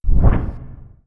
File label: wings; Earth and Beyond; EA Games